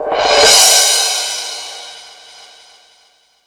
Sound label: Crash, Drum Machines E-MU Pro-Cussion